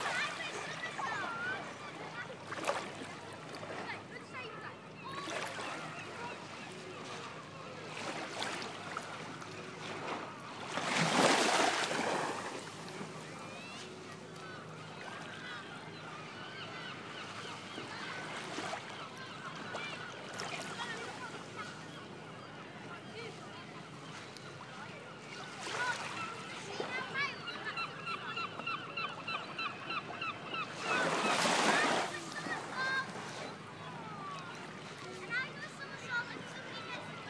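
sound of St Ives harbour at sunset lapping water seagulls and children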